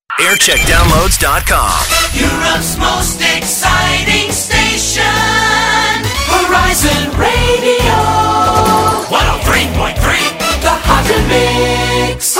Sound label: jingles hotter mix; horizon